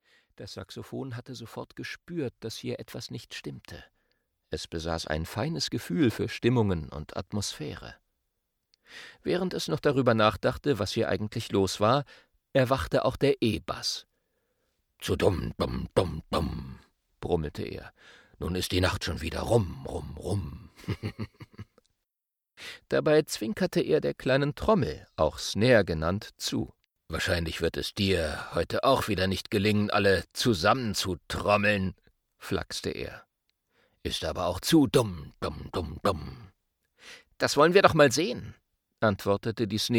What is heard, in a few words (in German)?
Das mutige Saxophon